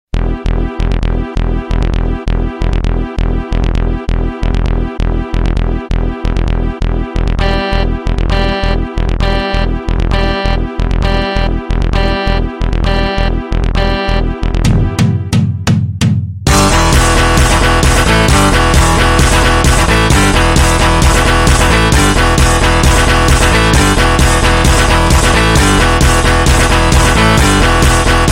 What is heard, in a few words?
Matrix Alarm